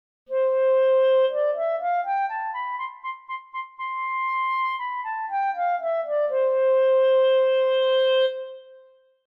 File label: Clarinet